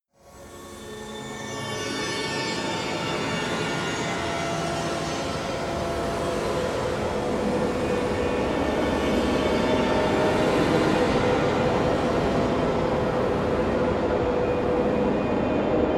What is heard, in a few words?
scrapes tensing scariness, drones